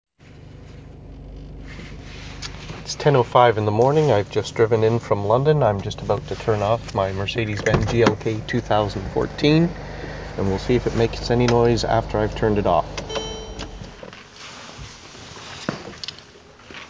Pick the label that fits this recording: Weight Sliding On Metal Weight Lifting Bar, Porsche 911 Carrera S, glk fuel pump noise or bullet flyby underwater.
glk fuel pump noise